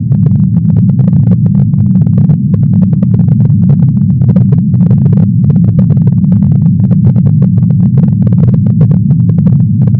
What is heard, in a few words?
fumarole